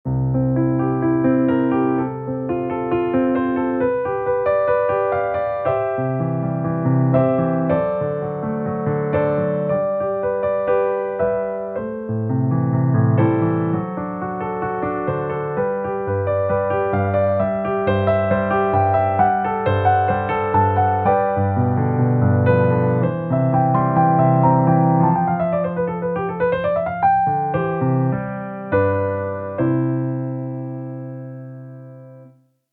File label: Little Prelude in C Major, piano; BWV 939; Bach; piezas faciles piano